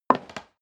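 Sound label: step high heels wood creak single left, footsteps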